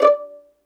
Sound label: D5 PIZZ, Violin; Q Up Arts; Denny Jaeger Master Violin Library; PERFORMANCE